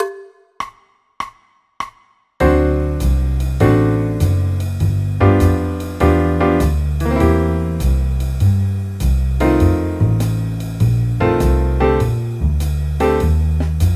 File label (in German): bb dur akkordverbindungen